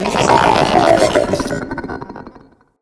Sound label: Moa Idle, Vocals